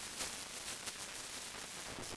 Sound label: noise